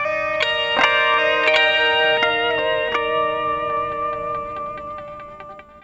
Gt Atmosphere 082 D#, Lounge Atmospherez

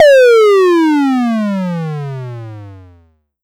Pollard Syndrum

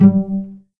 G2 PIZ CEL, string; East West; The Ultimate String Collection

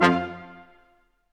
BRS Quintet stac; Roland L-CD702; Quint.Stacc

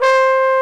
BONE, TROMBONES; Greytsounds VOL-1

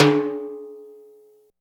Low Timbale; Groove Elements

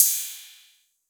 PERC 808

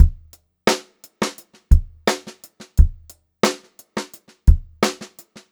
87 STR BEAT, Funk-Groove Drumloops; USB Soundscan vol.38; AKAI

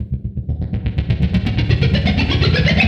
FX, Futurist Drum & Bass

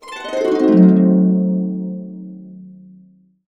C DIM7, Harps; USB Soundscan vol.62 - Complete Harps; AKAI

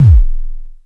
KLONE KICK LO, Klone Dual-Percussion-Synthesiser; Drum Machines

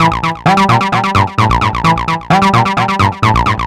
NRG Lead, trance

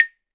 Claves; Sonic Foundry (Sony Creative Software); Joe Vitale Latin Percussion

